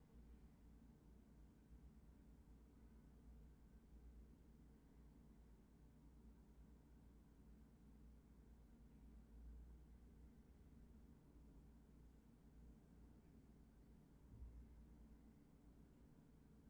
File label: Room Tone Cuarto Pequeño